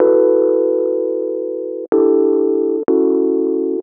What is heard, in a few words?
125 Rhodes Dom E, Keys, Nu Jazz House